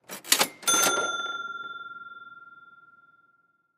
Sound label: Historical Antiques Cash Register Open Ding